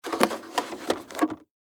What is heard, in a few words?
Office Fax Machine Load Paper Into Feeder Lexmark X4270